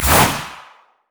shadowswing; zed shadow

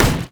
golem basic attack; monsters; LoL

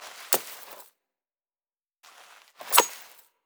emote laugh; LoL; draven

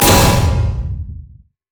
impalingcharge; LoL; hecarim

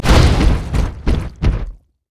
body slam; LoL; gragas

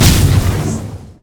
thunder strike; LoL; leesin; blindmonk

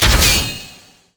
dash spin; LoL; vi racer

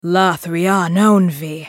ultimate effort; Karma; Character Voices - English

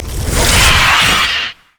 spider smash; LoL

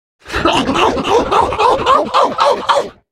spellcast effort

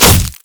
basic attack; LoL; skarner

